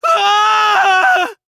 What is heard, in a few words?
scream; gonta